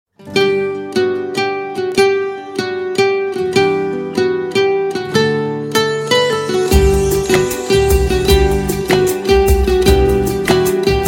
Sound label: Romantic Tone